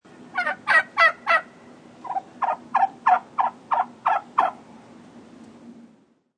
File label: Turkey gobble with squawking.